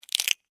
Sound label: Insect, Claw, Pinch, Snap, Movement, Bug; Insect Claws; Sound Effects:Animals:Insects; Blastwave